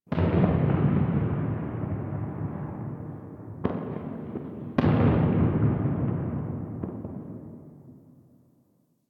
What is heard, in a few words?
Ambient Distant Explosions; Nineteen Ninety Nine